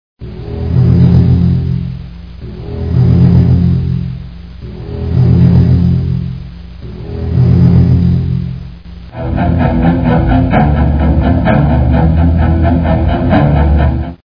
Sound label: jaws